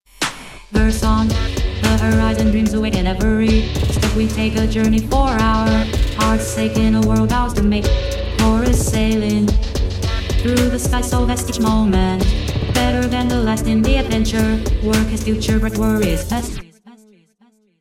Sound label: Dark Trap with Text to Song; Voicemod